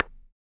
PERC CLICK, MALE PERC; Spectrasonics Vocal Planet; Jazz FX

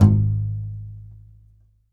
C. Bass Balalaika, Plucked Strings

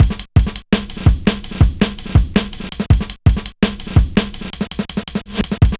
Persistent, Jungle, Drum Loops 165.5; Zero-G Jungle Warfare 1, 2 & 3

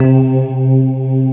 SYN K-2000, Roland

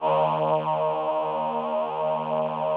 VP-330, String Machines

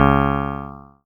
C#, PIANO; Big Fish Audio; Synclav FM

